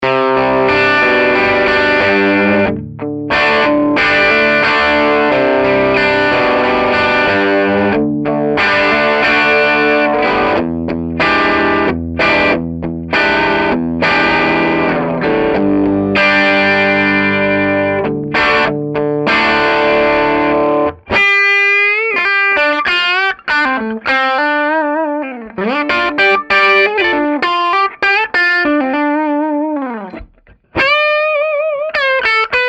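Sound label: classic crunch bridge, humbucker; schwarzbach; HB59-Classic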